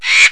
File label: BIRD